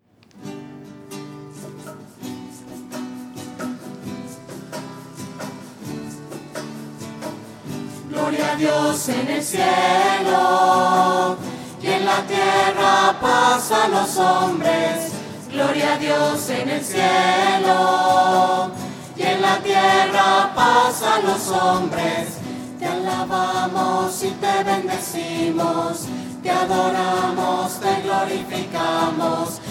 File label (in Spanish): gloria voces; misas 2012